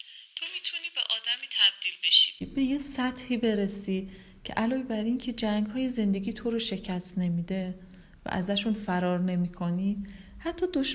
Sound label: pad